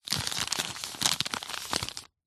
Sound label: paper wrapping gently handling; foley; zapsplat